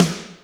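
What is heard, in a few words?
Snare Spiked, Alesis D4 drum machine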